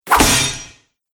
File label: Samurai Sword Impact; Impact Of Honor; Sword Sound Effects